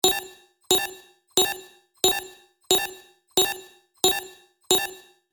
Activation sequence alarm loopable

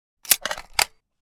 Sniper rifle reload

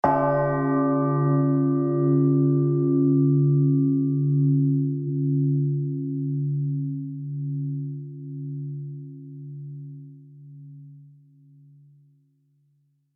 Cathedral bell ring single hit; church bell

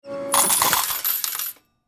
Vending machine coins dropping into return; money

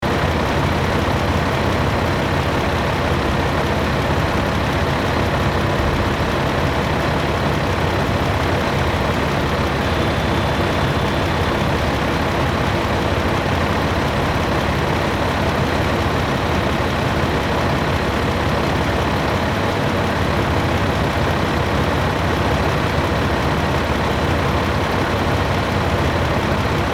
Truck crane engine idle loop

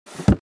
Wooden drawer closing

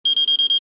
Urgent fast beeps

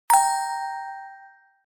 Alert notification

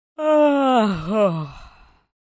Middle aged female yawning

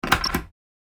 Small wooden door open; household

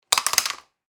Headphones falling on surface soft impact; headphones and speakers

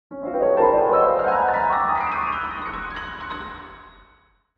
Old vintage cartoon danger

